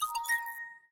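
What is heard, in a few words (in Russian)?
звук напоминания музыкальный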